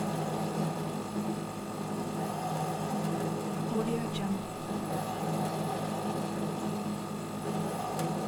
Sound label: air conditioning external unit loop